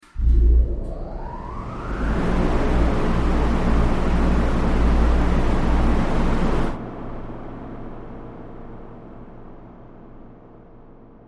Power Up Large Wind T, factory

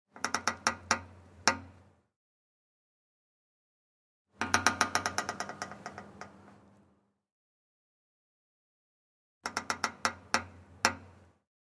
Door Metal Creak X3; hinges & creaks

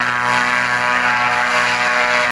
Fan Gas Powered Prop; fans big

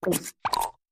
Circus Machine L / R Ch. Cartoony, Robotic.

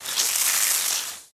Water Hose Spraying A Tree, Medium Hard Start

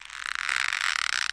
Rope Strain High Pit; ropes & climbing

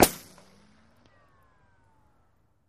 Bullet Ricochet Sin

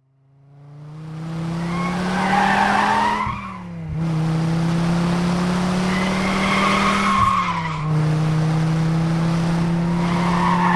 Vehicle Speeds Out C

